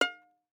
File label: Violin pizz ff sul E Gb5; MIS Pitches - 2014; Strings